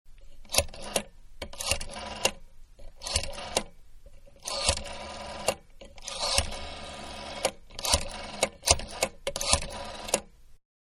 Rotary Dialing Randomly, Telephone; Electronics; Telephone-Rotary-Dialing Randomly; Telephones; Manhattan Production Music

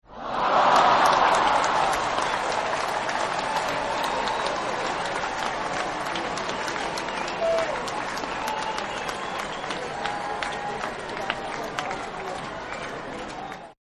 Applause Waoh Long; Applause Very Light; Human; Partners In Rhyme

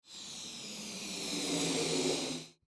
Party Balloon Blow Up Single Breath; Foley; Blastwave

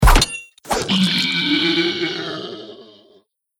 Kill Monster With Sword; Monster Sound Effects

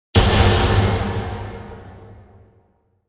Velocity Crash, Impact hit; Impact hit for music production, video editing, movements, logos, flash, or animation

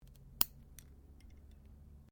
NAIL CLIPPERS CLIPPING TOENAILS

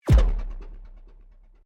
Sweep Mechanical Fast; Foundation; Created sweeps, swooshes and other movement effects; Sweeper; Sound Design

